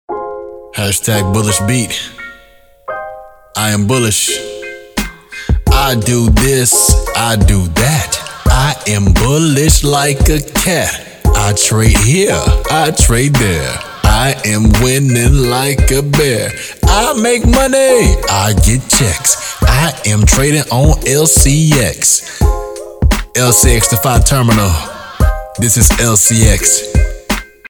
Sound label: Bullish Beat